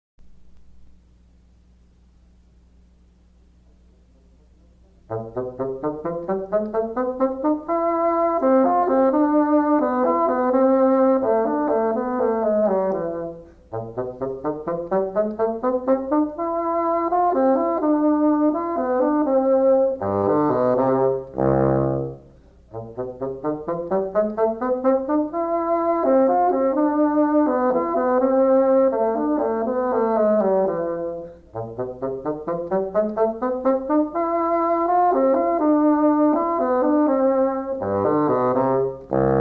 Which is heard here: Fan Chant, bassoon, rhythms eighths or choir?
bassoon